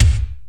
Korg x5, Drums

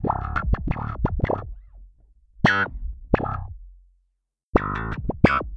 Electric Bass; Sonic Foundry (Sony Creative Software); Hydroponic Hip-Hop

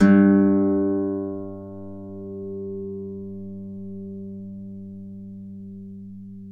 Celtic Harp

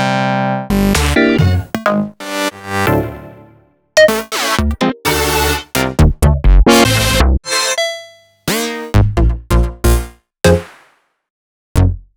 Synth Shots

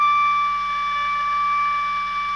SOP GR D 5, Saxophones; Greytsounds; Philip Wolfe David Crozier's Saxophones

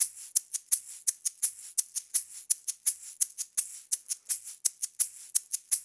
LOOP, SHAKERS, Percussion

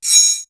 BIG RASP; Univers Sons; Basicussions

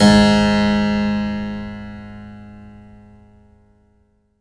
harpsi G#2; HPSCHD 4

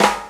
flam, snes chip

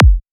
synth kick

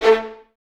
Violins A 3, String Ensembles; Miroslav Vitous

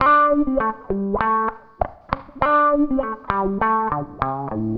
WAH, WAHWAHGTR; USB Soundscan vol.31; Miro s Grooves; AKAI

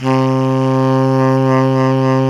SAX TENOR, Roland Super Sax; Roland LCDP07; Tenor V-sw; Tenor 3way

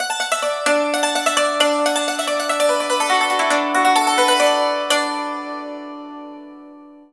YANG CHING, Heart of Asia; Spectrasonics

